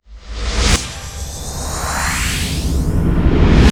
pickup

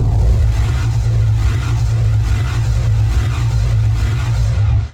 engine lower loop

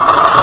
door moving

